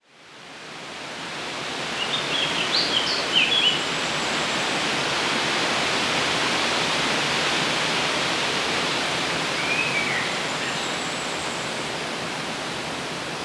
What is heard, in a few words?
Tree Ambience; Biolateral